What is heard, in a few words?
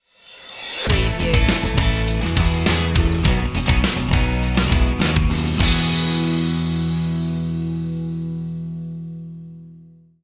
free and easy stinger